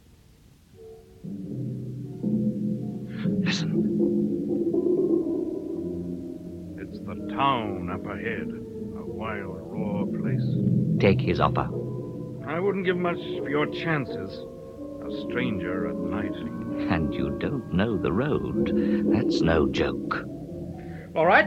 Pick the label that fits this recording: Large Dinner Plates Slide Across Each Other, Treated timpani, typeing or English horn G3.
Treated timpani